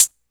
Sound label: smooth hat sample a sharp key; Mono